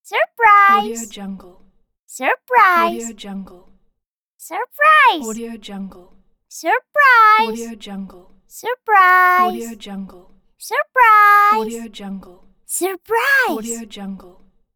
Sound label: child voice surprise